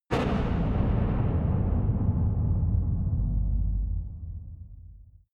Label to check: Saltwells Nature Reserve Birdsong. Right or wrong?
Wrong